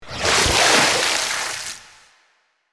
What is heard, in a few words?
Water magic spell magic sphere